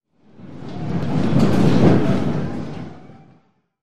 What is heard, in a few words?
Players Fast Run By, arena foot stomps